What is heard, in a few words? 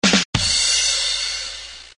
Rimshot